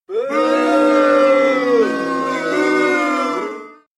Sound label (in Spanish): efecto de sonido abucheo